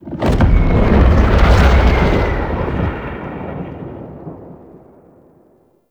distant cannon fire; Makvoss; Warframe; Tau

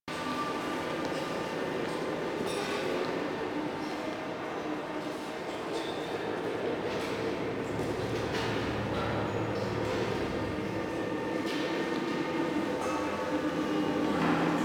Locker Room Ambient Loop; Warframe; Venus Open World; Venus Solaris United

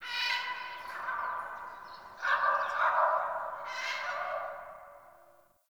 Bird Tropical, ambience; One Offs; Glades Of Andurin

